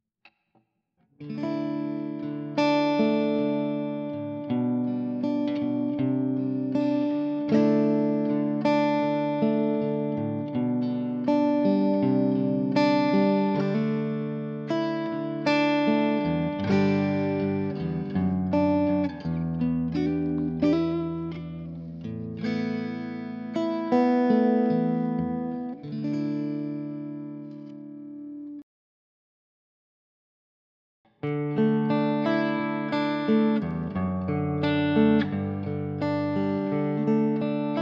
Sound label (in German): eakustik neck bridge und akustik pu, elektroakustik telecaster